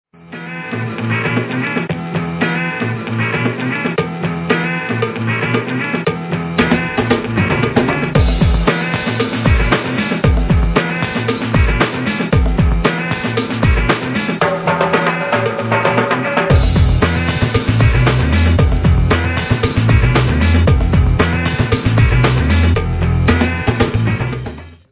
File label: Ring